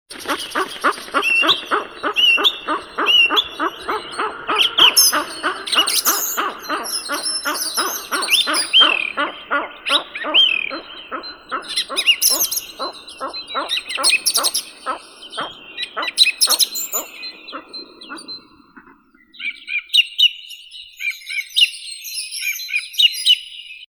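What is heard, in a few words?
capercaillie, Tetrao urogallus; devchonka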